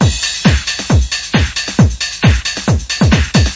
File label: 135 bpm, classic house, drum loops and breaks